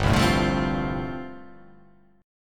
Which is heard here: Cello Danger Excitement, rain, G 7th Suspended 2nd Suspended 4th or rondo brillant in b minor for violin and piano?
G 7th Suspended 2nd Suspended 4th